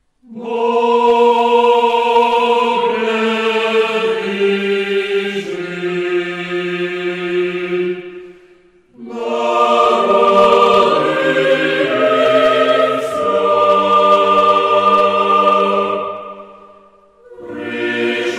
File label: Ukrainian Christmas Carol